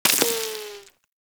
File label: Bullet Impact Debris Dirt; Warframe; Weapons; Bullet Impacts